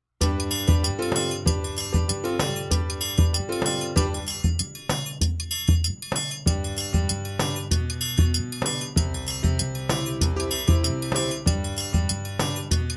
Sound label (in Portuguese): Playback Baiao Si Sol Mi